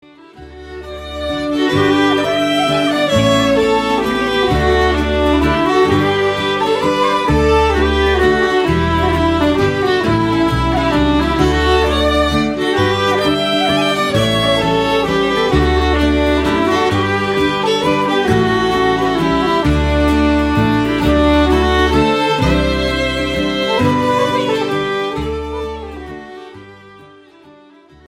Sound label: waltz; le messie